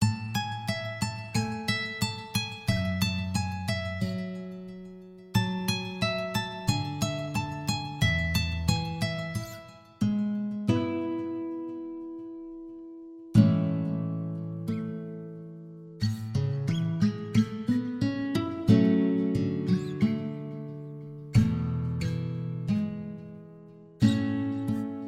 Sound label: guitar nylon stringed; yuuhi